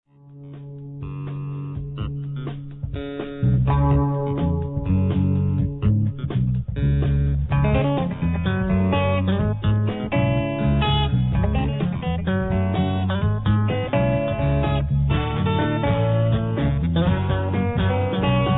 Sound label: lick